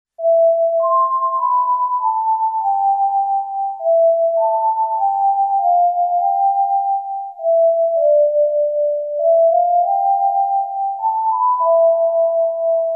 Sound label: Flute Solo